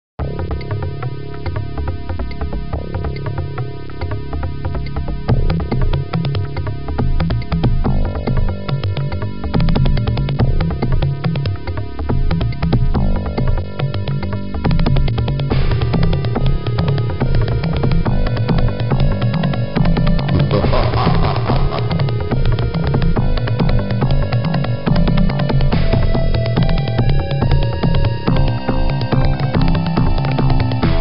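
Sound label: music from Mortal Kombat on the Sega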